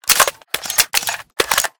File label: AR55 mag out